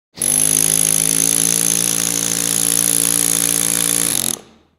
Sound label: Jackhammer drilling short breaks